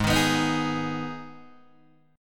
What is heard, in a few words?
Ab Minor Ab, Guitar DADGAD; strummed; 6,6,9,8,6,6